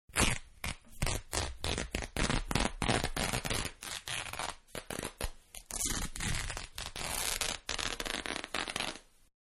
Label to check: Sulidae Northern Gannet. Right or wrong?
Wrong